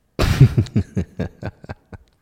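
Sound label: simple singular laugh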